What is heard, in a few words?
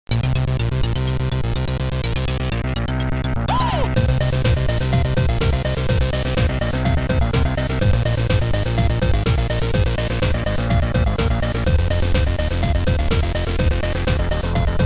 Techno Party; Apidya; Amiga